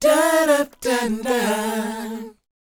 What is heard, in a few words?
DOWOP E, Vocal R&B DOO WOP; ILIO; Vocal Planet